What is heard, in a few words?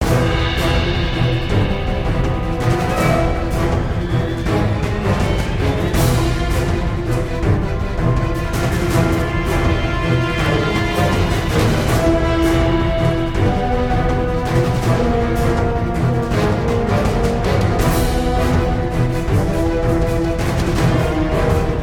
freespin melody; Flying Dutchman